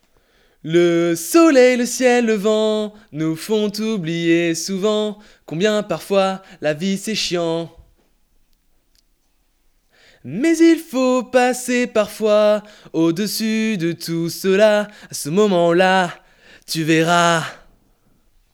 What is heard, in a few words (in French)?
Voix principale